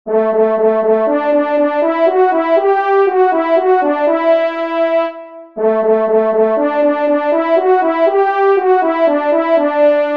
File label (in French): Retraite prise Solo, trompes; Fanfares imposables en Concours